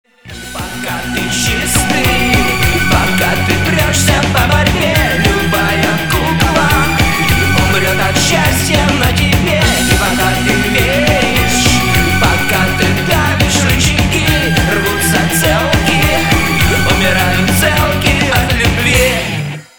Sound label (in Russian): рингтон